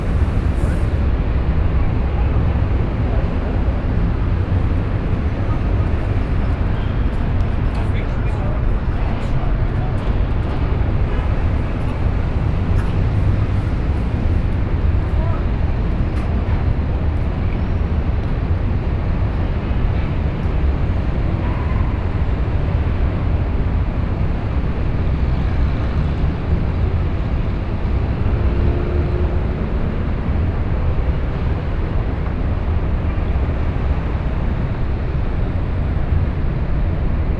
SP Edificio Italia, Brasilien

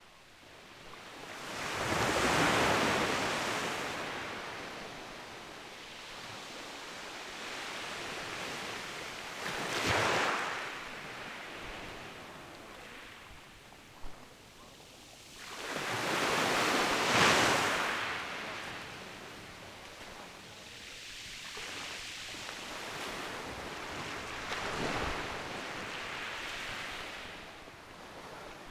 Playa Forti; Rode NT4; Curacao; Fieldrecordings

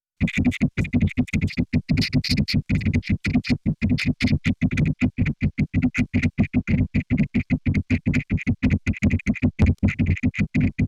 Roland 707 - Vocoder Beats

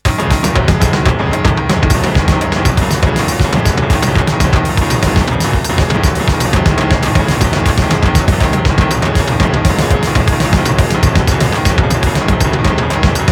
piano tr707

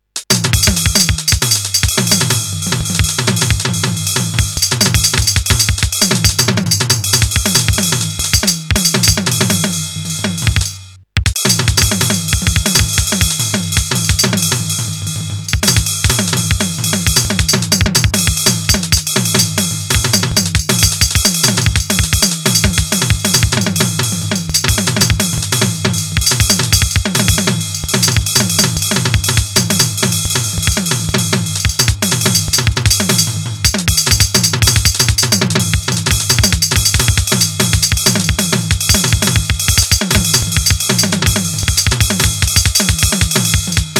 noise beats

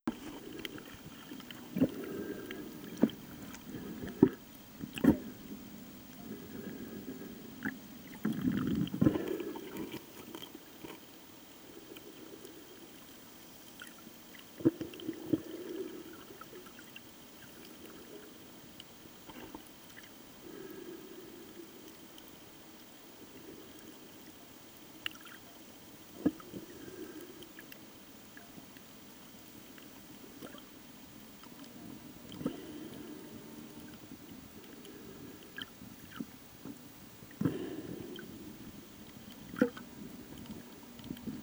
Playa Porto Mari; Curacao; Original Quallenvideo Ton; Fieldrecordings

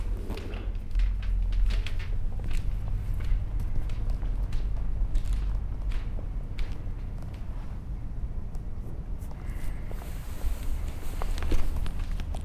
London (Walkman Performance, Probe)

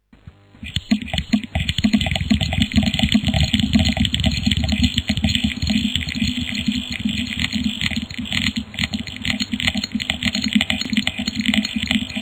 noisebeats instrumente

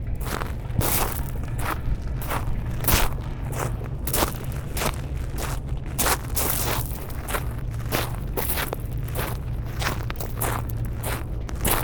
Walk, London; Walkman Performance

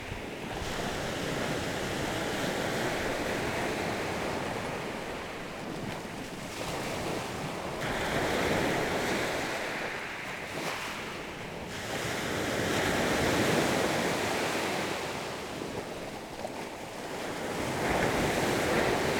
Playa Forti; Rode NT 4; Curacao; Fieldrecordings

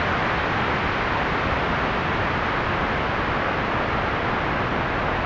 noise beat; Samples Rauschen

